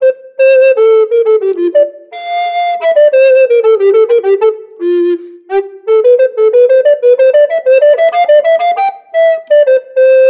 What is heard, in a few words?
Classical Yamaha YRT 304 B II Tenor Recorder